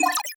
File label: ui drag click